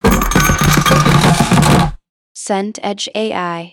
table drag floor

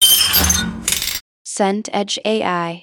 van sliding door

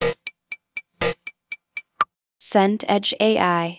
countdown ticker

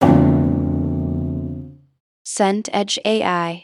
piano cluster low

